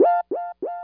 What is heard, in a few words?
blip; SpecOps2 MPlayer Demo